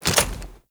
Flak Cannon Equip; Warframe; UT Flak Cannon

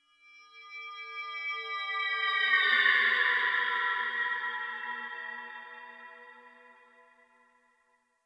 Bell Weep; Ambience; Interior; Warframe; Orokin

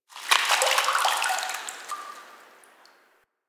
Interior Drip Goop Run; Ambience; Misc Indoor; DE; Warframe; Infested Eris

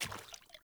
Sandals Walk Water; Characters; Player

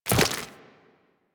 Run Goo, Footsteps; Warframe; Tenno

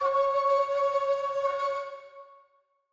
C Sharp, Player Instrument; Yoke Idol

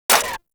Pistol Mech; weapons; Warframe; Thanotech

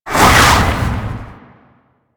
Baby Dragon Split Shot Cast; Enemies; Warframe; Duviri; Void Dragon

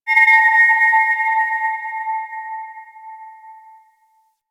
Moon Chimes Medium, Ambience; Warframe; Orokin

